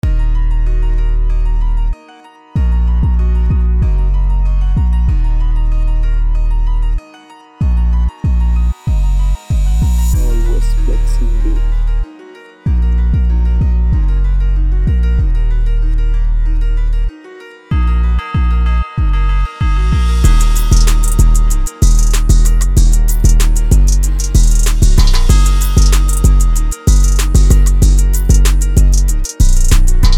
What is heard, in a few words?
going hard 95bpm, Beats